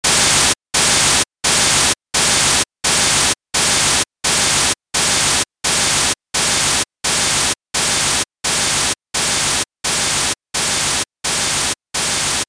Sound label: noise point 3 dB; quantifying 3dB noise